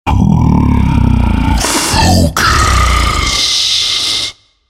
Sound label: ultimate effort; Rengar